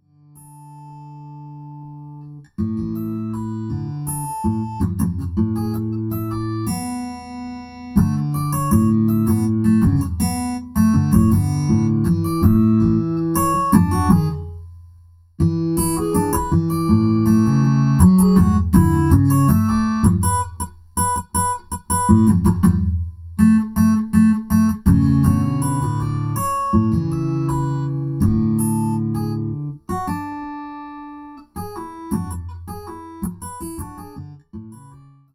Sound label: Guitar